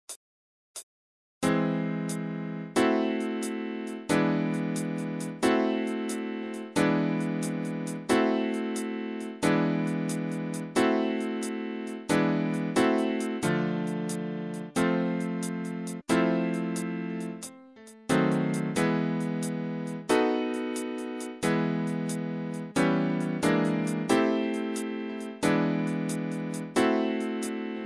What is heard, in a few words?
harp